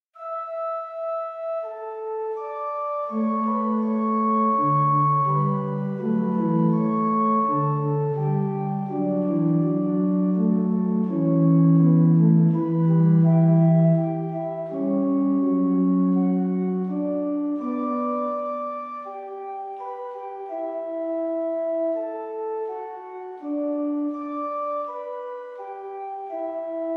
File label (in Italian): Klavierstuck fugato abbozzo per pianoforte o organo